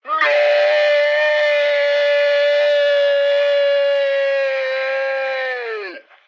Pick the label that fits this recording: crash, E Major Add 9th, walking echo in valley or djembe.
crash